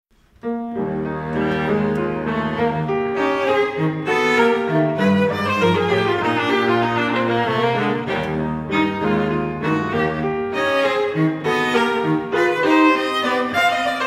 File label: Piano Trio in E Flat Presto